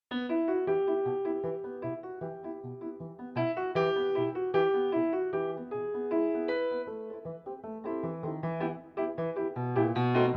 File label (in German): Chor; Bella Ciao